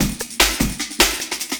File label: Beat, Futurist Drum & Bass; Drums & Percussion